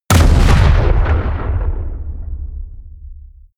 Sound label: explode close